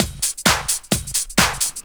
straight, Techno Trance Essentials drum loops

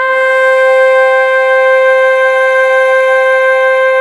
SAXY C4

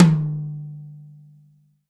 tom, Real Drum Kit, funk kit

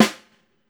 STR SNAR, Brazil Drum Perc; E-MU Producer Series Vol. 7 – Old World Instruments

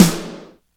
GATE SNARE

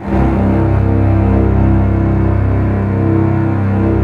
ORC C0 FF, Orchestra; Sound On Sound - Peter Siedleczek's Orchestra

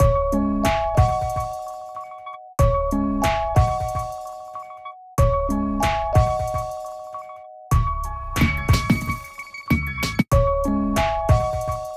Melody

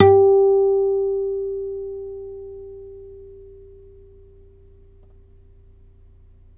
NYLON HARM G, GUITARS; Synclavier Sampler Library

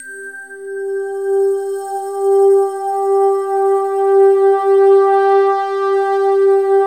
World of Synthesizers, SYNBELL

